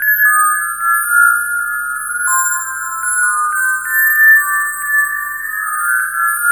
chimes, synth chimes; Zero-G Ambient 1

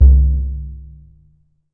EDGE TONE, Surdo; E-MU Producer Series Vol. 2; More Studio Essentials; Composer

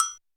U220 FX Set, Drum Machines; Roland U220

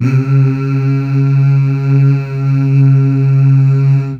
Mmh Male; Best Service Gigapack 1; CH BACK6

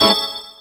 Cluster Hit Gbm9; Proteus 2000; Digital Sound Factory Proteus 2000

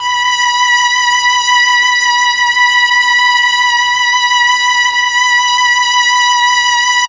MEL STR, Legendary M400; ILIO; Propeller Island

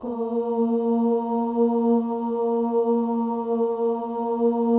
OH, FEM, Symphony of Voices

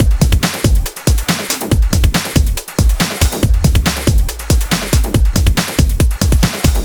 Break Beats, 140 BPM

